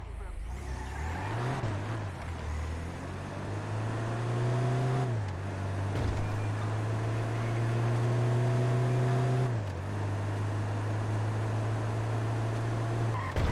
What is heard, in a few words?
diesel i4, vehicle sounds; engine sounds; policet,policet3,burrito,burrito2,burrito3,burrito4,burrito5; FiveM